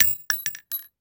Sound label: shell, revolver; guns; sven; cof